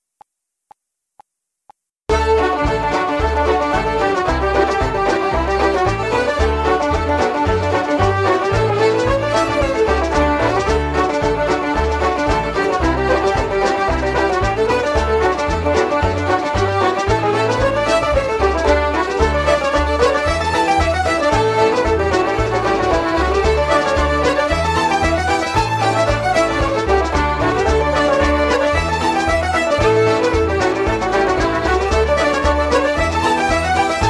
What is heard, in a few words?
Irish Ceili Reels Medley D, backing track